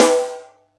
Yamaha EX-5

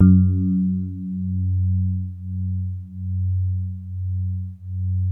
DX7 soft F#2, e-piano; Music Store

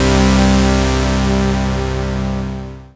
SYN K-2000; Sound & Vision - Gigapack I CD 2

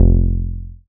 BAS F1, SCI Prophet-5 synth bass

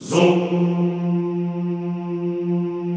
ZOOMMM F2, Classical Choir; Best Service; Extended Classical Choir

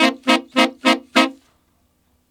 Sax Section in C7, Jazz; Traditional; Sonic Foundry (Sony Creative Software); Jazz Solos and Sections

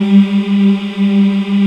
African Mm f; Roland LCDP11 Africa VOL-1

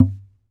SAZ TAP; Guitars; Spectrasonics; Hans Zimmer Guitars VOL-2

